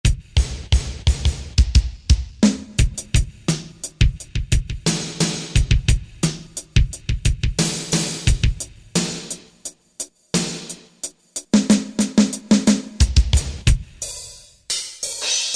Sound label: Drums, Korg M1; Factory preset examples